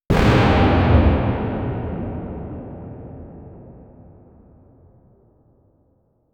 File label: explosive aura gem stone